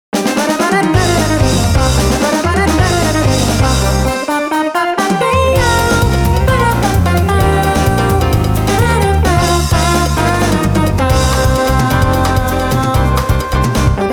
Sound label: Brazilian Anthem; DanceDanceRevolution X; arcade; PS2